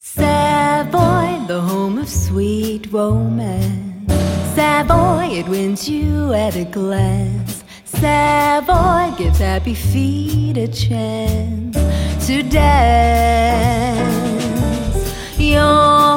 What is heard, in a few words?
Stomping Jazz Band